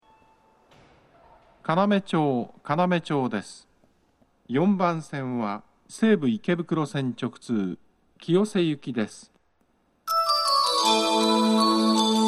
kanamecho melody

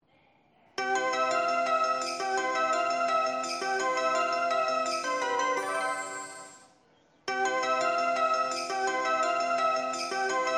utsunomiya melody

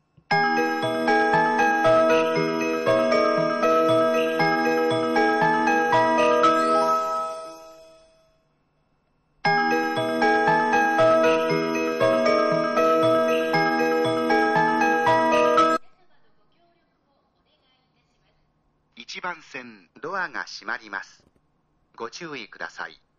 shin matsudo melody

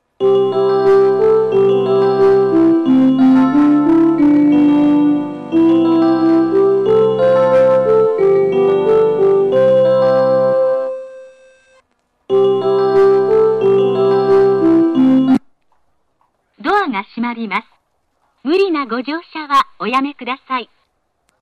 hachioji melody; 横浜線 橋本・中山・東神奈川方面 ■相模線 橋本・海老名・茅ヶ崎方面; 1.3コーラスです

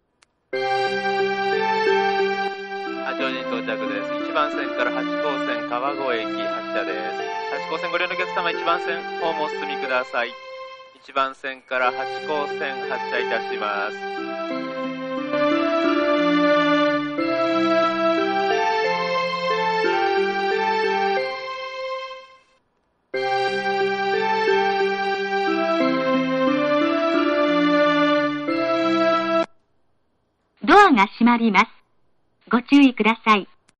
hachioji melody; 八高線 拝島・高麗川・川越方面; 2.6コーラスです; 始発

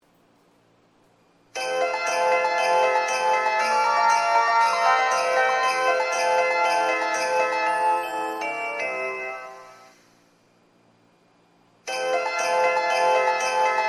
shin matsudo melody

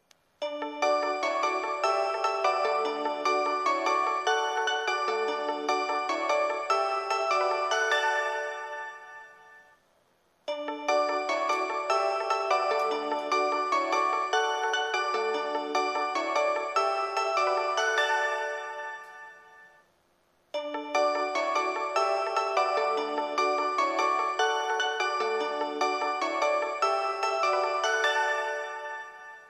kofu melody

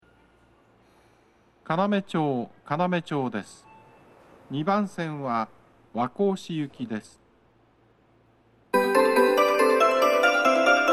kanamecho melody

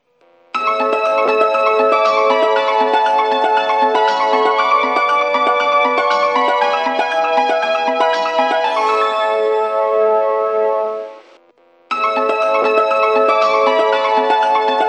kawagoe melody

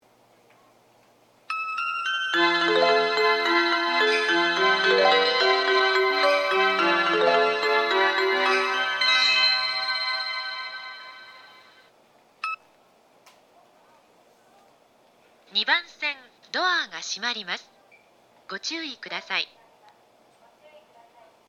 shin matsudo melody

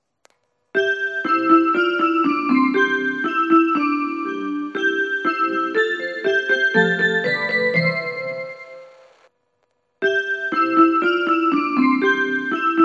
okutama melody

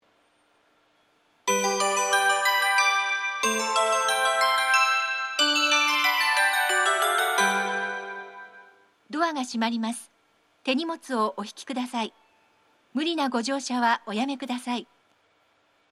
kotake mukaihara melody; 発車サイン音; 有楽町線 池袋・桜田門・新木場方面 ○副都心線 池袋・新宿三丁目・渋谷・ 東急東横線・みなとみらい線方面

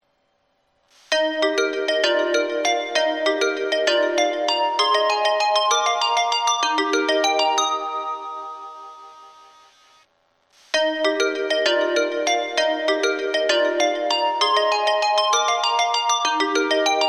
kofu melody

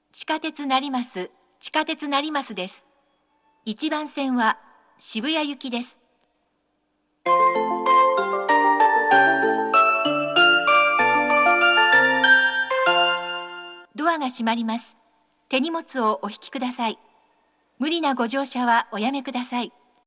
chikatetsu narimasu melody

